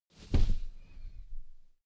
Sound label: wing flap low; natur-tiere; voegel